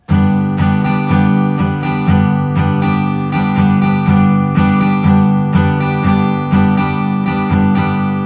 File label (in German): Schlagmuster